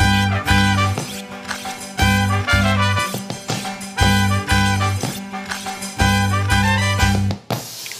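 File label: food world music inferno suspense no vox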